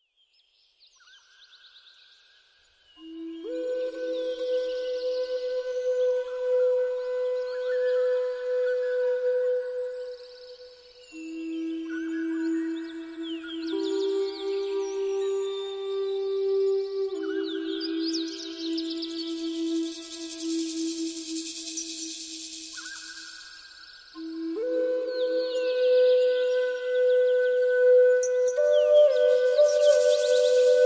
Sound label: Baby's Nap in the Field, Flute for Sleep & Relaxation with Sounds of Nature